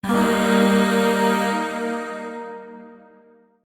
Shawzin Blues Chord; Warframe; Zariman Void Shawzin

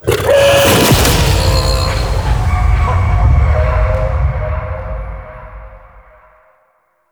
Howl Ability Cast; Warframe; The New War; Archon; Amar; Abilities

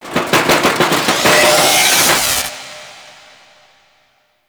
Sabotage Power Cell Rattle; Space Battles

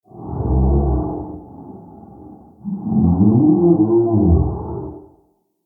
Vocal Groan; Ambience; Bromius Inside

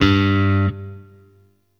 Shawzin Oct Two G; Warframe; Sentient Shawzin

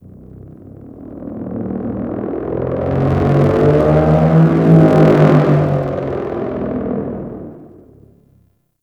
Exterior Small Ship Passby; Ambience; Warframe; Corpus Railjack

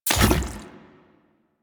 Crafting Equip; Warframe; New UI; Deimos

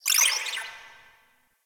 Tech Data Beep; Warframe; Large Ramp Hall

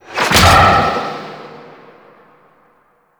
Golden Band Staff End; Warframe; Monkey King

